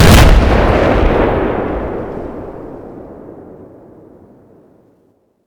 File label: rocket explosion distant